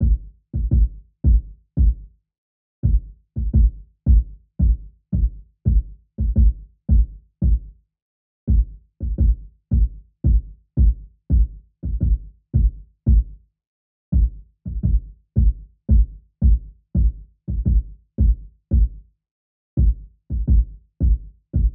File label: 170 BPM G Min Kick; RnB; Loop Stems; Cymatics; All 4 U; 2022 Melody Collection